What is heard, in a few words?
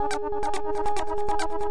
140 bpm, semi organic blips, drum loops and breaks